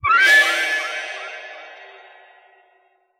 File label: flute and cymbal